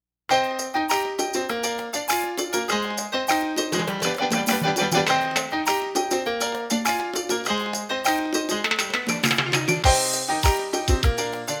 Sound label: Parvardegar (Instrumental)